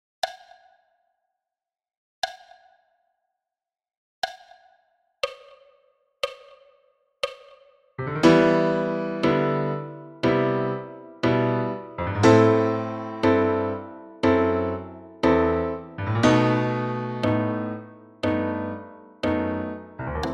piano solo a 60 bpm

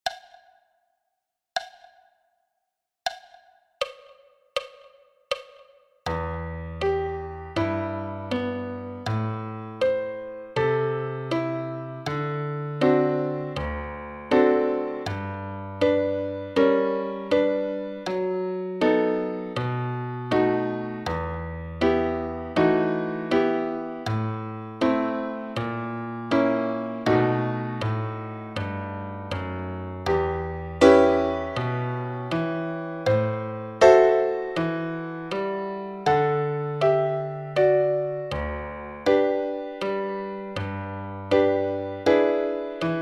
piano solo a 60 bpm; a 80 bpm

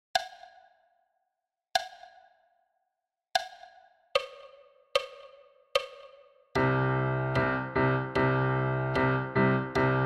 Piano et timbales a 75 bpm

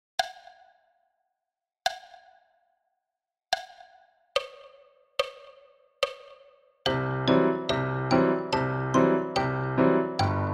Piano et xylo a 72 bpm

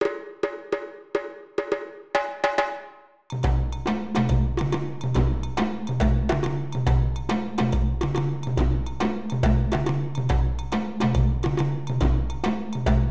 Yankadi playback djembes dununs break

